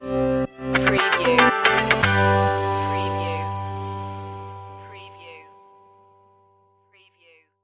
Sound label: mercury stinger